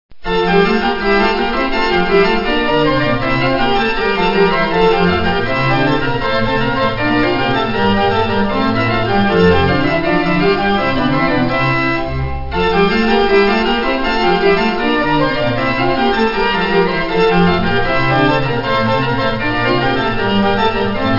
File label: hexachordum apollinis; pachelbel